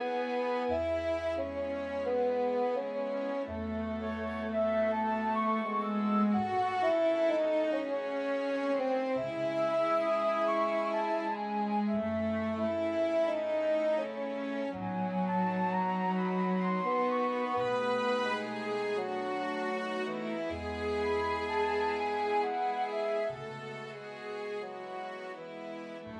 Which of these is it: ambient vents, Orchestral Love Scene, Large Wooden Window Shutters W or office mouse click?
Orchestral Love Scene